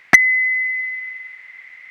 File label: ppm Signal